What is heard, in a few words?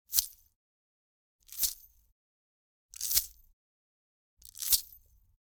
Generic Click Metal Small